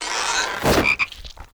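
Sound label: war attack, Mob; DEMOLIS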